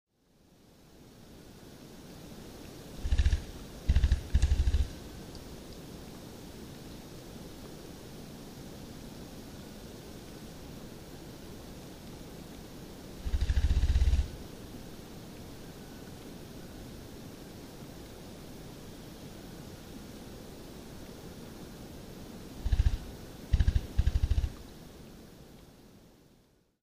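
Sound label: copper pheasant, chichibu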